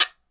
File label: Quide Short, Guiro, Percussion; Roland BK-5